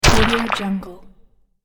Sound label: golem or stone troll hit; sonic-boom